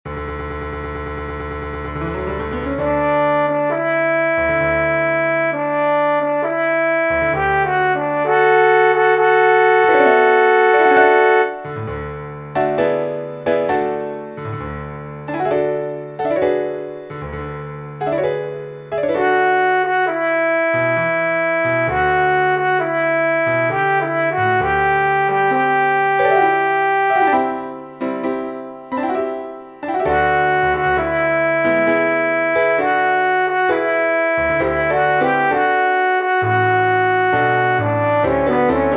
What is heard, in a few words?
Gloria Patri Tenor,chamber choir; chamber choir audition